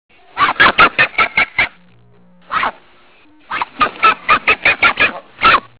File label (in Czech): ptak